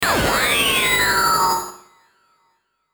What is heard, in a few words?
Game, transition